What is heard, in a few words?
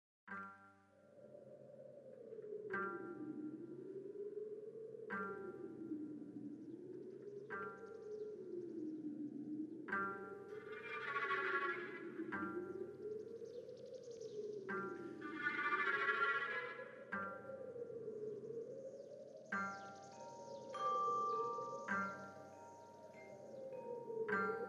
music